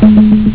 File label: earcon